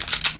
m4a1 slide, weapons; quake2

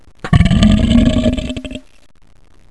drown; quake2; morticus

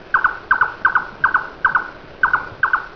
bird; quake2

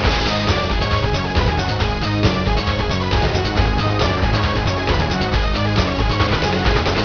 bar music; quake2

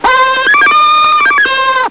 giggle; quake2

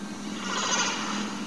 jump; quake2; jetstorm

